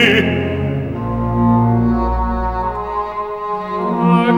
Scena (Bass & Alto Soli)

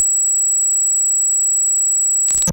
Raw Glitch

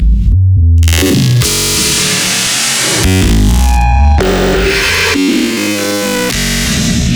Glitch Loop

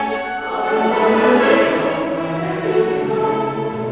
A Spiritual (Chorus & Soprano Solo)

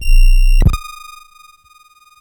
Raw Glitch

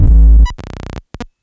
Raw Glitch

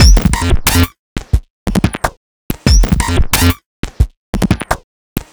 loop 90bpm

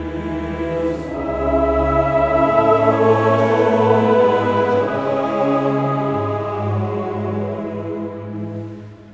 A Spiritual (Chorus & Soli)

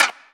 clap

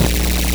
Kicks

